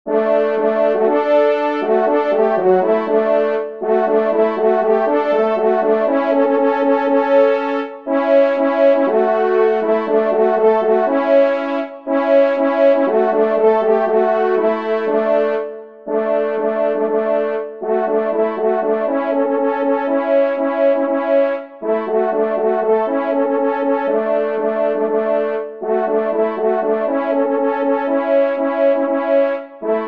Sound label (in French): Retraite, trompe; Retraite des Chasseurs; 2e Trompe